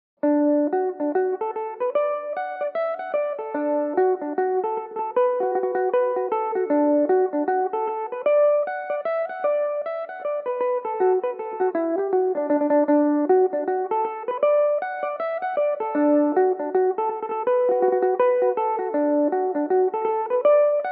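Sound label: D major, Irish mandolin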